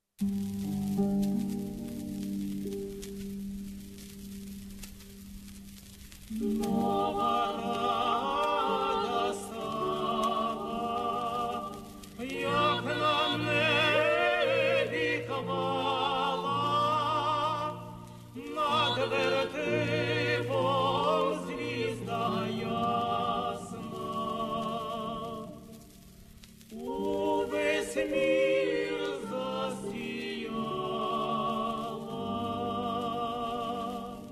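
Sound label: Ukrainian Christmas song, wertep song, tenor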